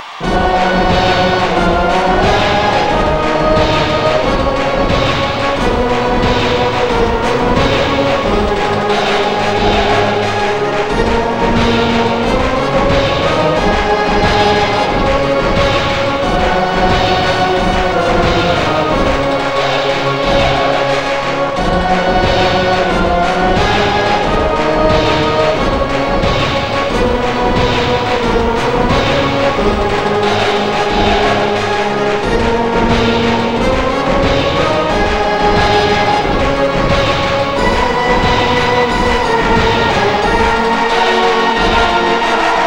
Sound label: Chants, Land Of Hope And Glory